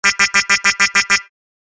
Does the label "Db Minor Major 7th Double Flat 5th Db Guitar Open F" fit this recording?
No